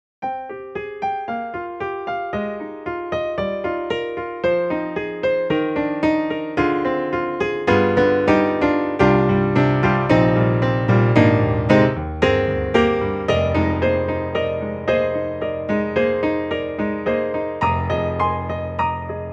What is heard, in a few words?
EDIROL Super Quartet, Concert Grand